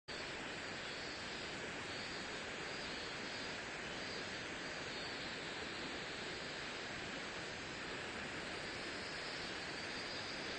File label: riverside